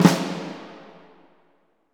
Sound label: SNR SONAR, Orch Snares; Roland LCDP03 Orchestral Perc